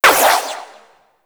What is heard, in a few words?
Shot, Double Barrel Shotgun; Warframe; Weapons; Tenno; Tigris Prime